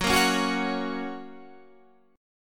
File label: F sharp Major, Guitar, Standard; strummed; JGuitar